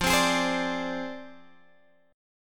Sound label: F Minor 6th F down Guitar Open F; strummed